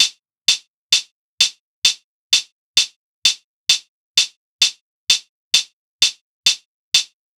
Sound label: Pop Kit 130bpm Hihats; EDM Drums; Drum Kits; Stems; Ghosthack; Drum Mega Bundle; Ultimate EDM Drums; Pop Kit 11